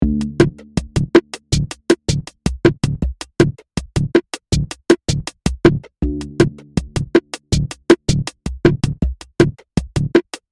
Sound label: E Drum techno loop